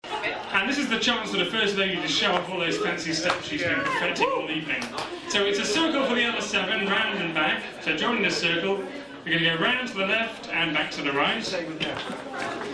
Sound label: eightsome reel